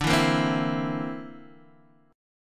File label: D 7th Suspended 2nd Sharp 5th D, Guitar DADGAD